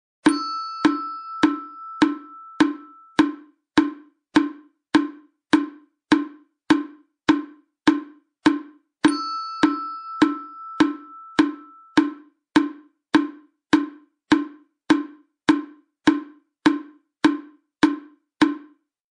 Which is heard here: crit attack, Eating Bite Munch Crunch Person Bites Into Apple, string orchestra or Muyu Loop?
Muyu Loop